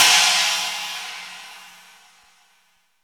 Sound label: R-8 china cymbol